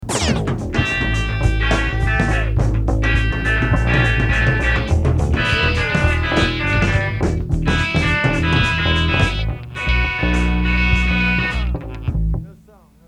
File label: ( More Guitar )